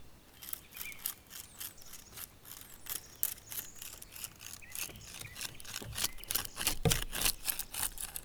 Fagot brindilles seches FROTTEMENTS; Percu Bois

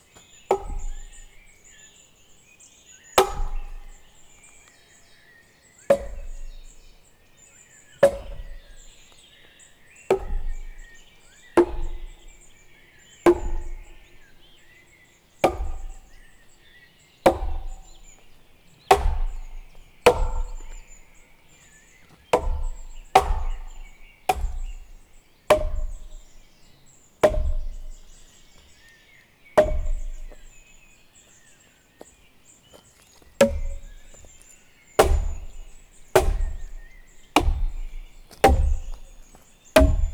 Gourdin sur buches x31; Percu Bois

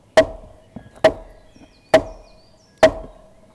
Gourdin buche Tom aigu x4; Percu Bois

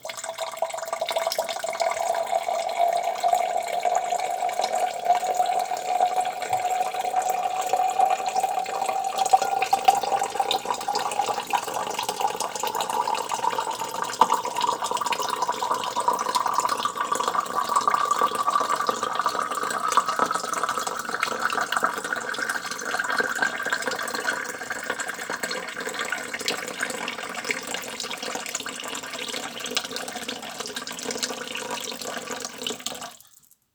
Coulis de l'eau Rapide Montant dans évier; Bruitages; Eaux

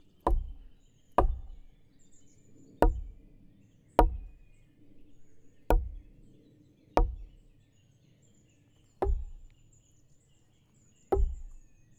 Gourdin de près, Percu Bois; serie; 1m

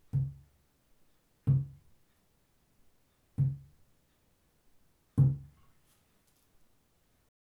Toms Moyen; Percu Bois

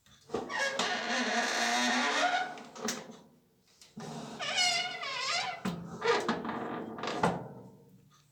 Porte qui grince; Bruitages

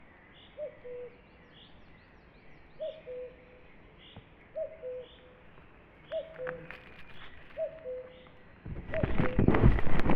Foret coucou c8x; Oiseaux

